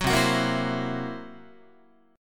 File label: C 7th Flat 5th C down Guitar